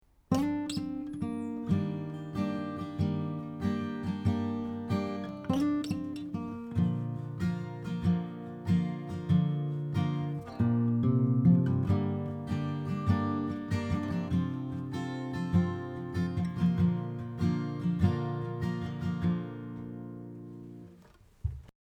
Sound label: acoustic